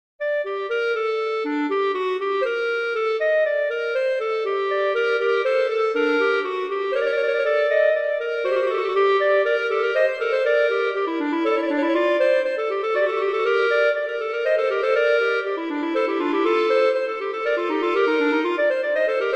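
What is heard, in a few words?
Vivace moderato, Viola Cello; Telemann; Canonic; Sonata 4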